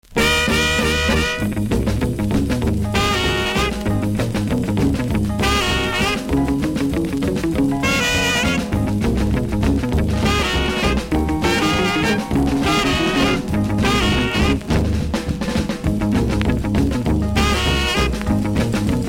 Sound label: Dynamics Scratch